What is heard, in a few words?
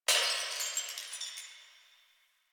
Distant Glass Breaking; Ambience; Warframe; Nineteen Ninety Nine